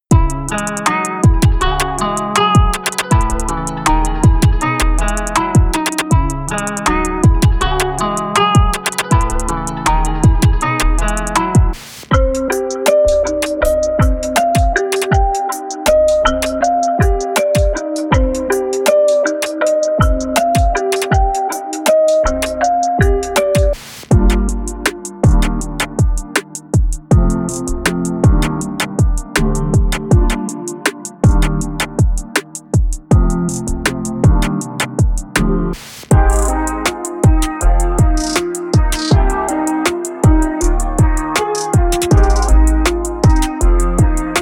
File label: Melancholic Hip-Hop; Osaka Sound; Pieces